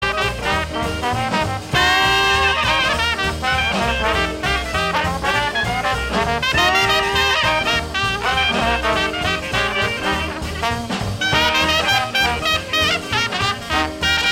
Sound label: Stomp